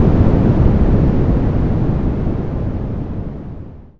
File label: explosion; Cannon